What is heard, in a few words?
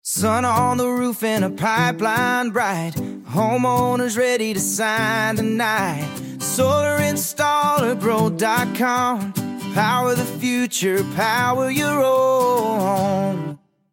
jingle